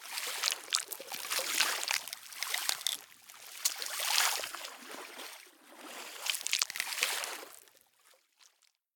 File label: Egg Wash; Devour; The Inn; Onsen